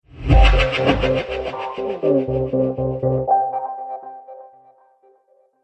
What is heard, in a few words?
Electro Swoosh Trailer Hit; Trailer Sound Effects